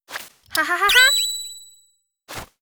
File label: blue Bird Win Arms; netent